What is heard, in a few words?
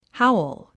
wo howl